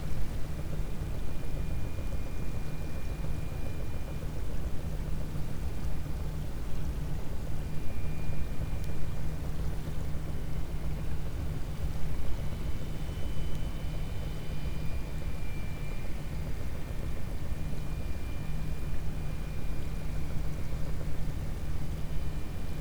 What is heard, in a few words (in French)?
cachalot negatif, Physeter Macrocephalus